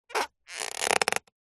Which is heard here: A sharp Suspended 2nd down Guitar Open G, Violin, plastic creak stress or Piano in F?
plastic creak stress